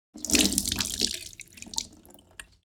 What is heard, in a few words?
Wet sponge squeeze dripping water; cleaning